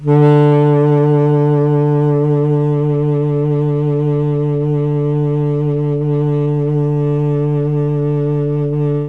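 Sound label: D2 Tenor Sax, Mellotron; CHMB TNR SAX; Mike Pinder - Mellotron Archive CD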